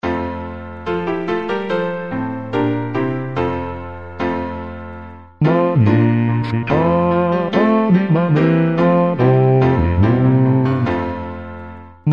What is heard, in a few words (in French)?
basse